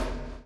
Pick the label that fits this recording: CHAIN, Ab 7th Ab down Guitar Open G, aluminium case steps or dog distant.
CHAIN